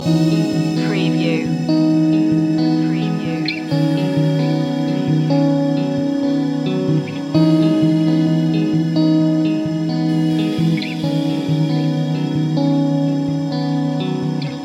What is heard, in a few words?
moving slowly loop